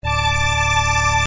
KAMLSTR, KEYS

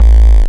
stomper, drums

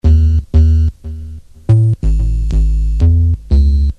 bass

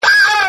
SCAR WSH, GUITARS

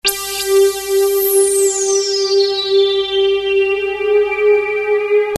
SPOOKY1, SYNTHS

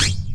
beat, drums

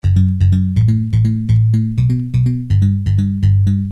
bass

